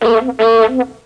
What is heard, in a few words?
klaxon; Mobiclic46